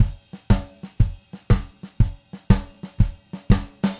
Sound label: Red Maple Groove 120, DW Red Maple Kit, Vital Drums; 120 BPM